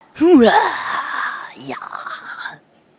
ninja groan; avant gardens

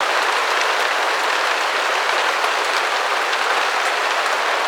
Infection Rain Loop; ambience

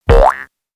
boing

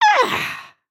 hat dance, emotes

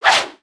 Light Whoosh; Valiant; aronwk collection; ndaudio

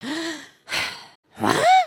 Shrug; emotes

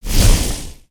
Spinjitsu Lightning One shot

